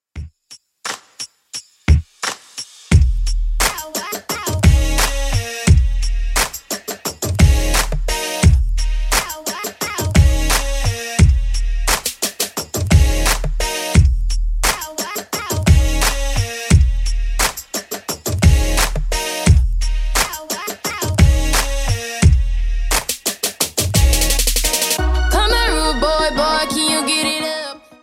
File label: Throwback Rnb 87bpm; Redrum